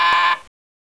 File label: BUZZ; QUIZ